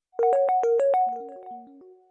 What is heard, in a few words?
instagram message sound